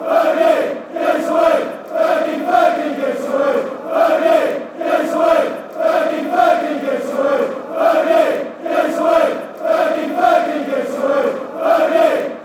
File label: give us wave, fanchants; fergie